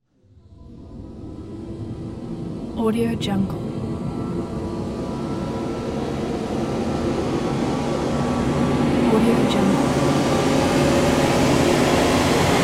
tension suspense rise

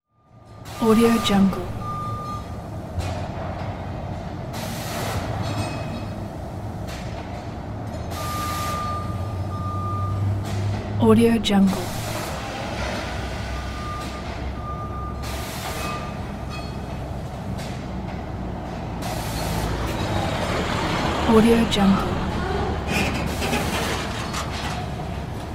factory ambience

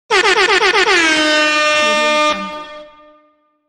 dj air horn

